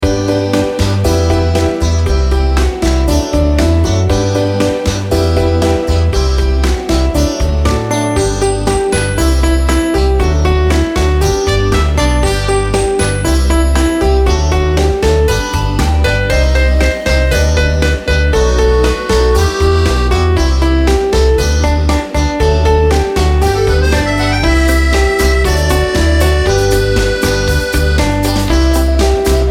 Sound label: karaoke